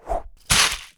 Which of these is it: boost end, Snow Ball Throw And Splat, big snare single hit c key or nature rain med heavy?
Snow Ball Throw And Splat